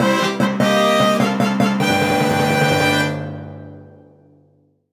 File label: Win; Mario Series